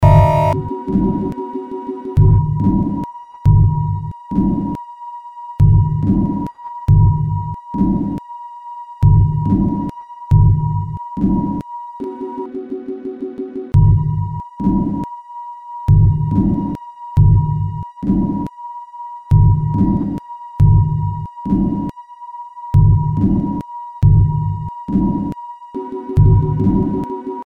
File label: Sinewave